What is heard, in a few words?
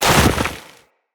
Land Soft Deep Snow, Footsteps; Characters; Operator